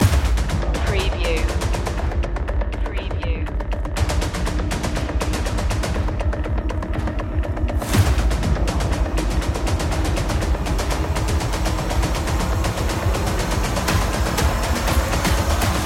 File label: attack force loop